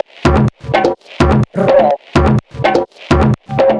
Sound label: tribal perc 126; Wave Alchemy Loop Tools and Grooves